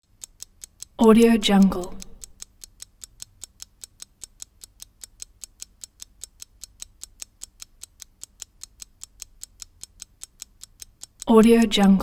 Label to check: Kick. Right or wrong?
Wrong